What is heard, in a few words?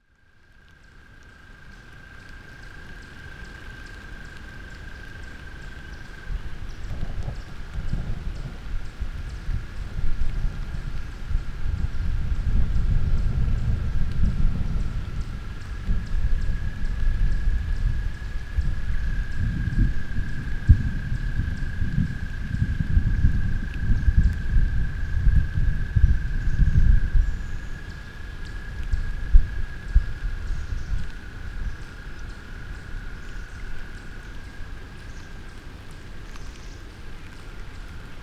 Storm Toads Atmospheric Surround